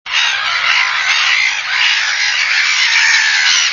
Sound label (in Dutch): kokmeeuw